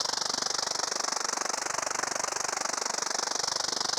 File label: propeller loop; enemies; grass flyer